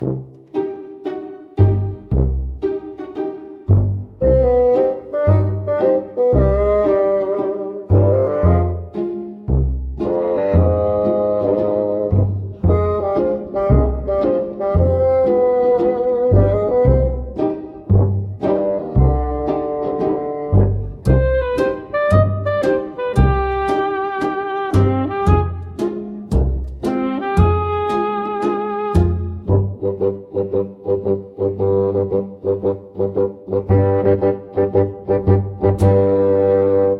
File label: bg music